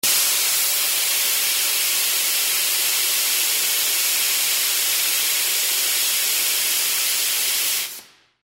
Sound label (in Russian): zvuk kompressora vypuskajuschego vozduh